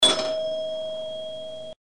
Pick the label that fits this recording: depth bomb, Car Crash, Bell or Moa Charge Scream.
Bell